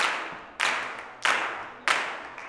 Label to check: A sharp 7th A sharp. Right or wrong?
Wrong